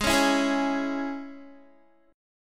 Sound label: Ab Suspended 4th Sharp 5th, Guitar; 4F,4A,5C,5G,5C,6E; strummed; JGuitar